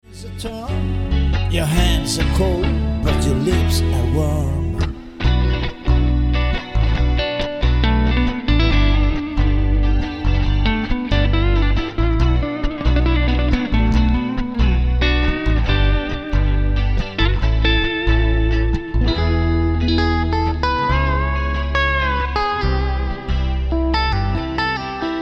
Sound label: Drums